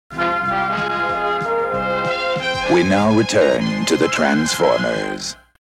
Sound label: Commercial Bumper Sting